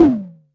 Tom Low

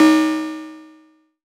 METAL

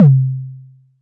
Tom C3

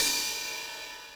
Ride Crunch

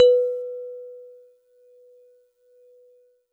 Celeste DX100 B3; Pristine Celeste

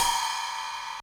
Ride Acoustic Drive

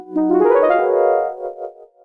Synth, Waoooong Multi

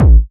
KICK A; Slamma

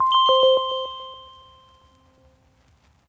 DX200 Bell Arp Vinyl Synths C3; vinyl leads

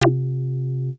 SYNTH Short Dotty

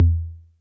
Tom Low 808 Color

